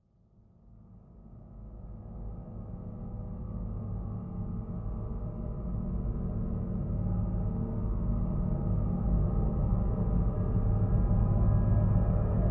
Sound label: upright piano drone tension; sonniss gdc